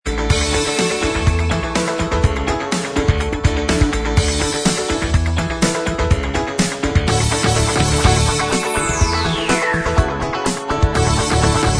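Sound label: instrumental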